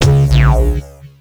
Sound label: Boss Hit